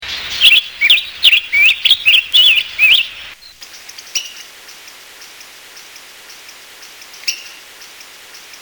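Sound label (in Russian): обеспокоенное щебетание дубоноса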